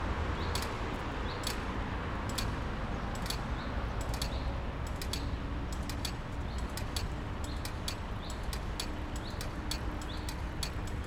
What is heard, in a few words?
Pedestrian crossing